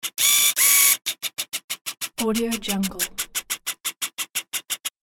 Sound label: camera autofocus